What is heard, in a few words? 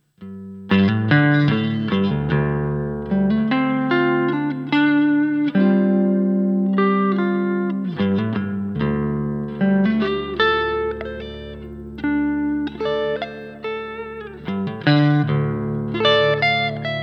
neck solo